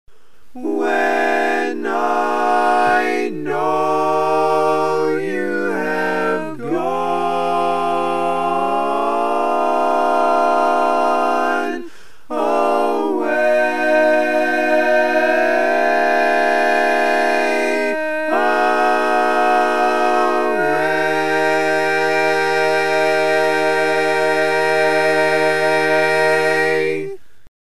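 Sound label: Baritone, barbershop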